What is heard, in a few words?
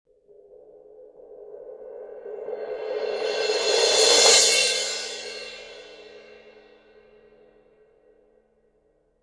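suspended cymbal roll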